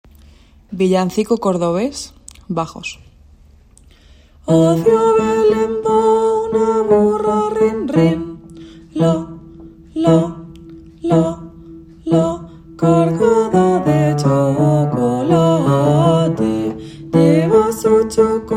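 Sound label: bass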